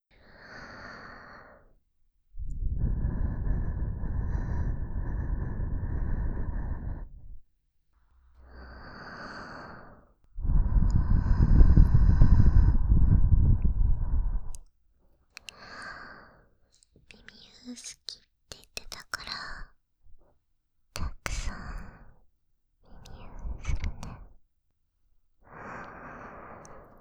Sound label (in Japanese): 耳ふー（耳元ささやき）; 彼女が寝かしつけてくれるASMR～耳かき、添い寝、心音～